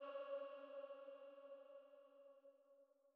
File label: Female Pad C#3; KG MegaSaur